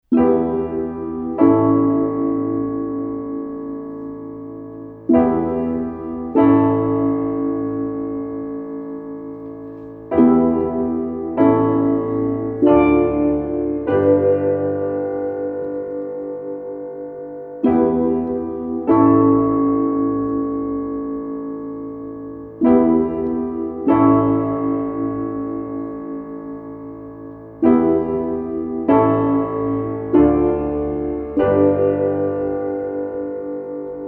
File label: chords